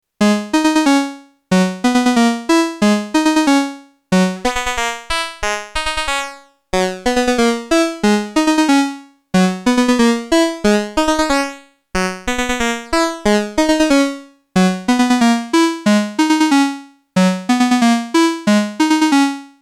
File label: Qun Mk2 Square PWM FM Bitcrusher; Nunomo